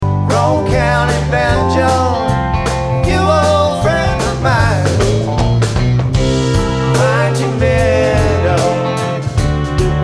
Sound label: Banjo